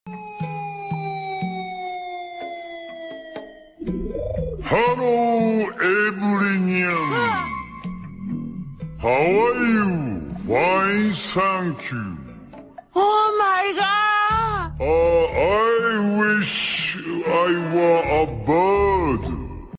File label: bird; reversevape